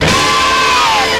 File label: scream nongender; ooh ahh